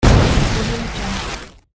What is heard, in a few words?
fire spell